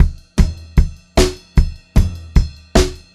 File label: 152 SP TOM, Pop-Rock Drumloops; USB Soundscan vol.37; AKAI; 90 s Sample CDs; 152 SPEED